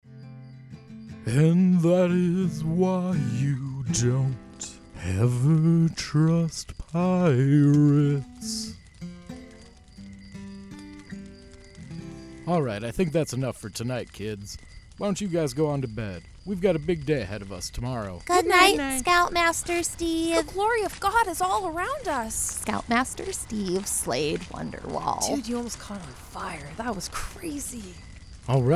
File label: Campfire Song